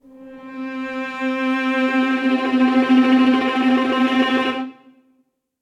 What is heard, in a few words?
Spectral (Pressure) String Quartet; Instruments; MPE; Ableton; Factory Packs; String Quartet by Spitfire Audio